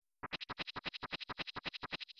fast walk; harvest moon

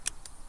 communication sound upgrade

